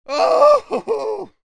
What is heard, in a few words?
Crazy male psychotic laugh